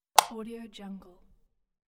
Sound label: switch